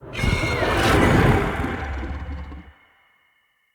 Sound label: Wolf Cast; Orengall